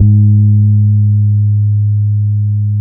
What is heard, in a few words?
bass, G#3; Spectrasonics; Bass Legends CD 1